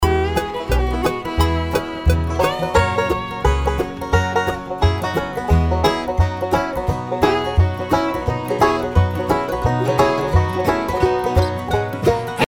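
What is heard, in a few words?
banjo solo, original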